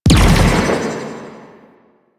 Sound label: Glaive Air Explosion; Enemies; Weapons; Warframe; Teshin; DE